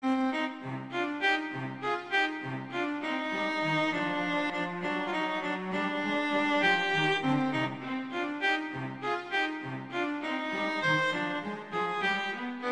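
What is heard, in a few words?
Duet, Andante non troppo lento, viola; Mazas; Duets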